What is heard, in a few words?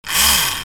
Mermaid Servo Short